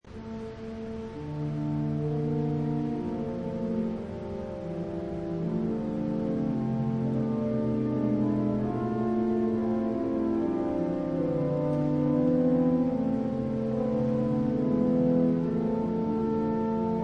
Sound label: Organ interlude